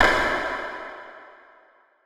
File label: plate, reverb; understanding reverb; anderton